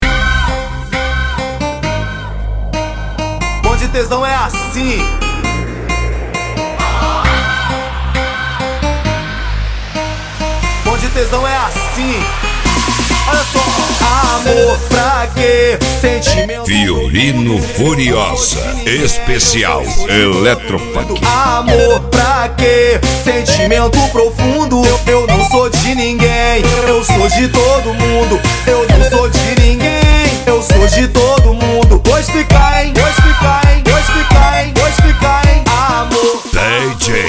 eletro funk

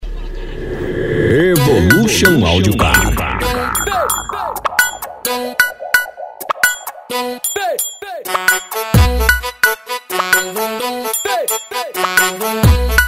funk evolution audio car

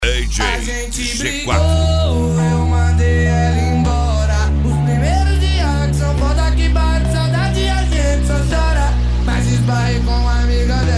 eletro e viola especial de eletro funk

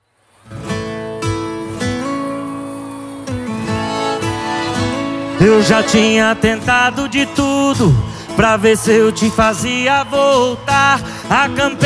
funk e sertanejo

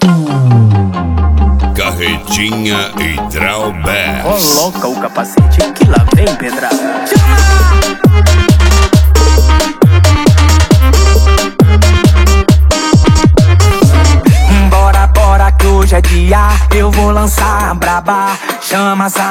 piseiro

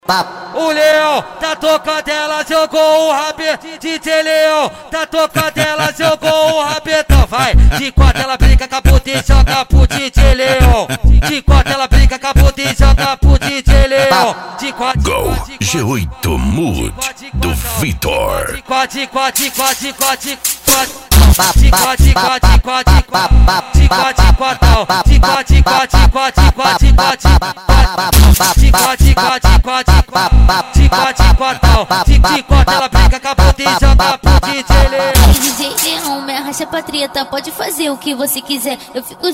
funk ritmado

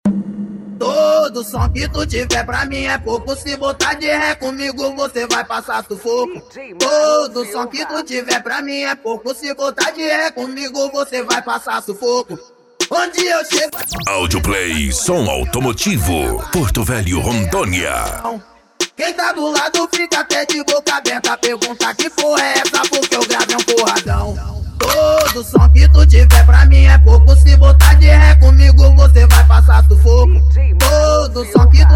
esp funk mega bass

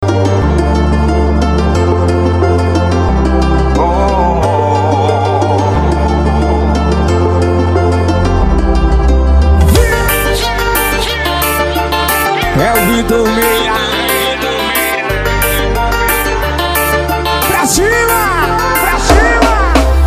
sertanejo e forro

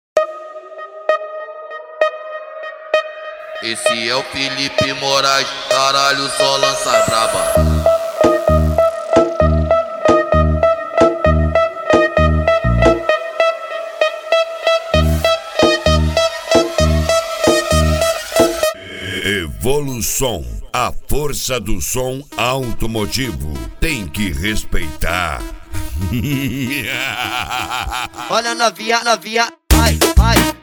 noia dance

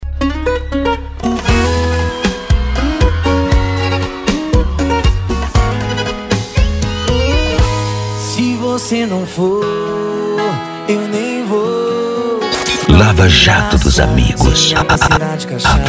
sertanejo e pisadinha; cd volks sound car

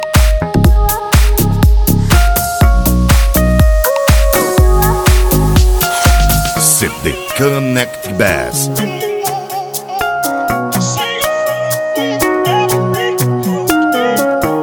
bass house e rap

